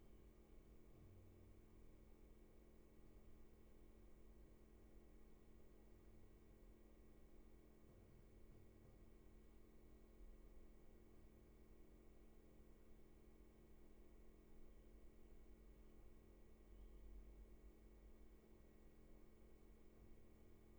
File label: Roomtone